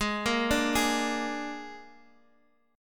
Ab Suspended 2nd Flat 5th chord; x,x,6,3,3,4; arpeggiated; JGuitar

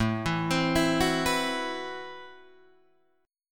A Minor 6th Add 9th, Guitar, Drop D

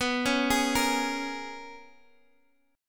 Bb Minor 7th B; Bbm7/B arpeggiated

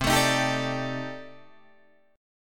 C Minor 6th Add 9th, C, Guitar, Drop D; down; chord shape

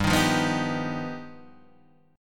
G Augmented 7th; G+7 Chord {3 6 3 4 4 3} strummed

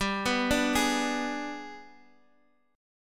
G sharp Minor Major 7th Flat 5th

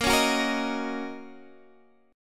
D sharp Minor 7th

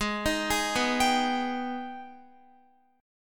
Ab Minor Major 7th, Guitar; arpeggiated; 4E,4A,5D,5G,5C,6F; x,11,13,13,11,14; JGuitar

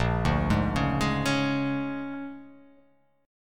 A sharp 7th Sharp 9th

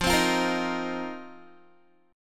F 7th Suspended 4th F down Guitar; strummed

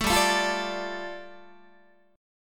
G 7th Suspended 2nd, Guitar; strummed; JGuitar

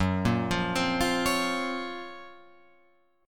F sharp Major Sharp 11th, Guitar, Open F; 6,5,5,7,8,8; arpeggiated

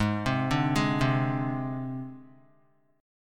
G sharp Major Flat 5th, Guitar

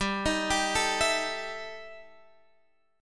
E Minor Major 7th Double Flat 5th, G

